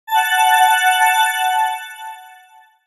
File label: Shimmer